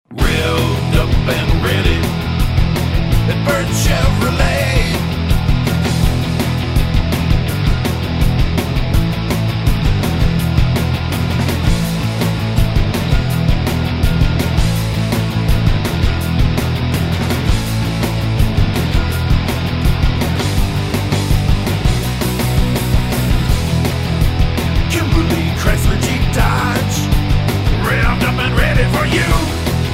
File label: revved up, jingles